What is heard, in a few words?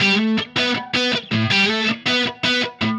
Happy Pub Guitar